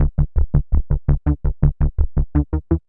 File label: Synth Bass